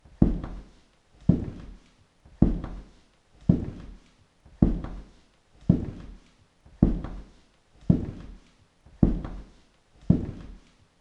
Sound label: Slow Heavy Footsteps